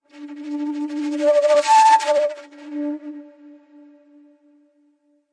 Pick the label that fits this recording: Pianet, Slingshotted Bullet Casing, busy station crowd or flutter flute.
flutter flute